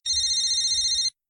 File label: cellphone